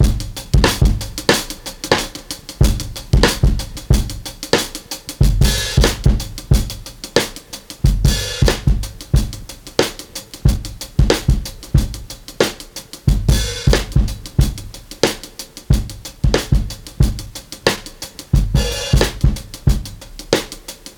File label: Break; Kount's Reel Break Library